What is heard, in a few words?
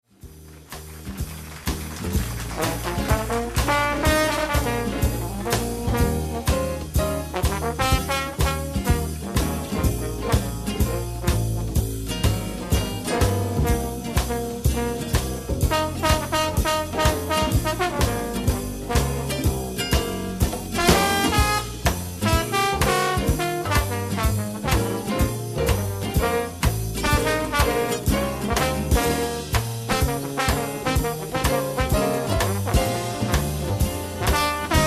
blues march